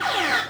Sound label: laser